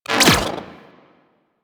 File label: Split Laser Retract; Warframe; Corpus